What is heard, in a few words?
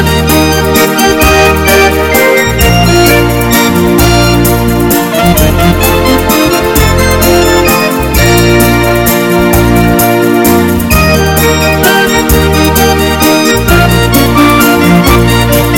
Beautiful Slow Waltz